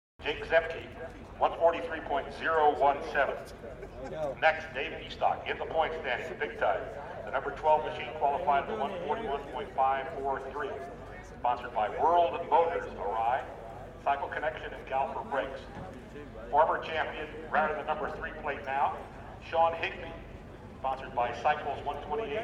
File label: Motorcycle Race, road racing